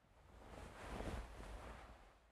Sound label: Baby turnover; anemoi